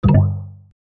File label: Call Drops; konnektedstudios